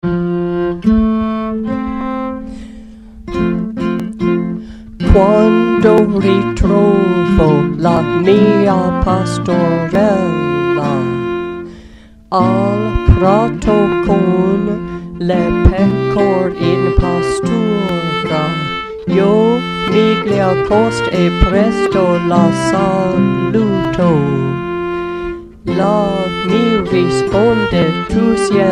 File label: Sopr Wds; Quando ritrovo